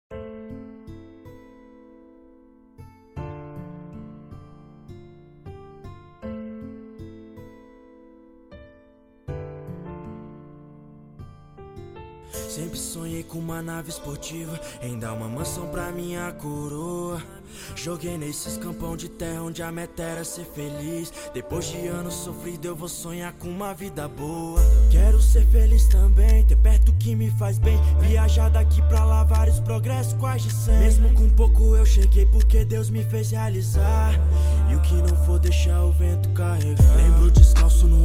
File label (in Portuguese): graves bass